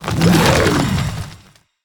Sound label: Saronite Shambler Attack